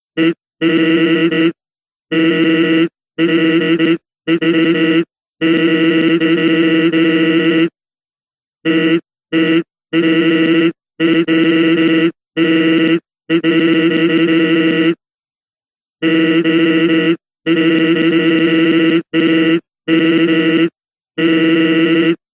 boombox; sans; jailbreak

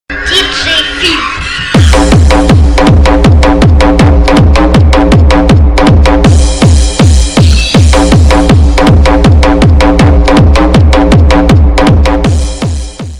electro; roundsound

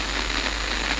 static medium; cstrike; D26 slender

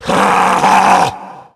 Tank Spot Prey; cstrike; RA Zmsounds

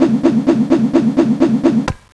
tentacles knife idle

